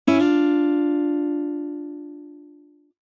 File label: Strumming; Itel itelOS 13